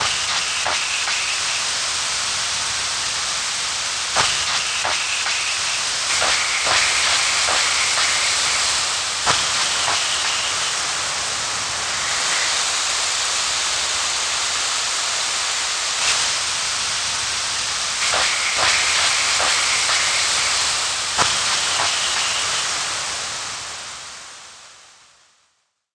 gas; Seer; GamigoNA

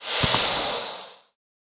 Ghost Run

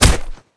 Dmg Bow Cloth

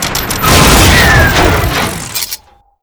Die; Albireo; Gamigo

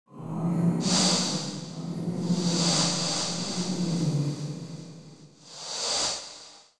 Ghost Idle

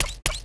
Dog Run

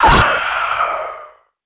Die; ElfKnightSoul

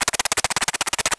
Crab Run

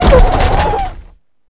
Mimic Die

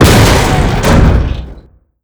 Die

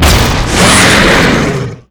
Big Yeti Skill

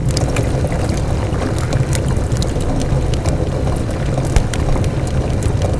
cauldron; Seer; GamigoNA

